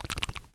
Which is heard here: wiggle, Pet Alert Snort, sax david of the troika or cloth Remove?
wiggle